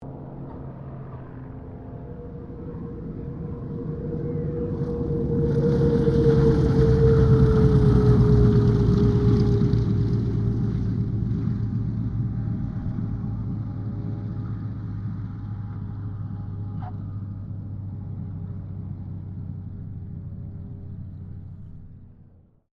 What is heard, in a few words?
AIRCRAFT CA-13 BOOMERANG LANDING; Airplane Sound Effects; Airplanes (Sound Effects:Vehicles)